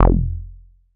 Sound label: bass classic acid F; Polyend; ARC Noise